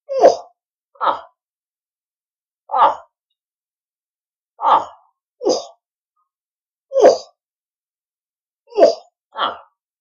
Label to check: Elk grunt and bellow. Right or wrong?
Right